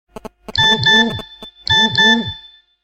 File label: Sms Reception